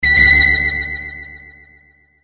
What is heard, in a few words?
Big Mouth Screech